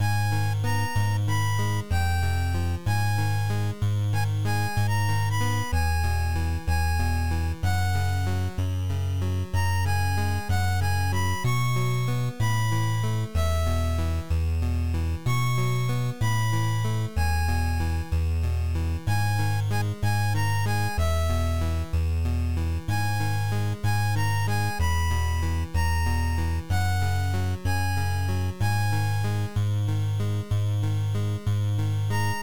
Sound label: Game Over; Quarantine game